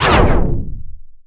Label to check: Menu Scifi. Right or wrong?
Right